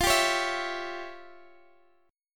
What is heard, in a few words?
E Minor 6th F; Em6/F; strummed